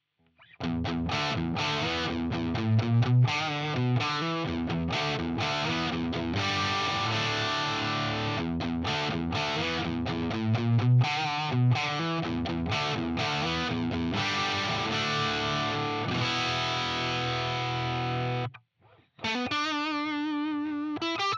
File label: Epiphone ES335 DIST bridge